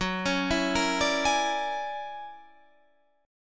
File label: Fsharp 7th Flat 13th, guitar chord